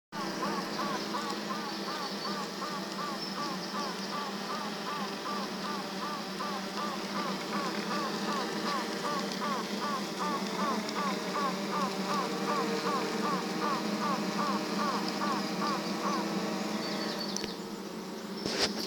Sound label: piping queen